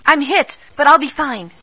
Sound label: hit bad; sven; npcs